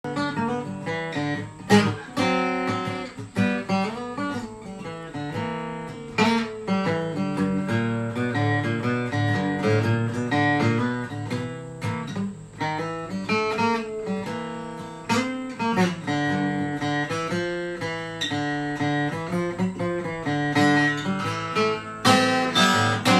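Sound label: oscar schmidt weyman keystone state sunburst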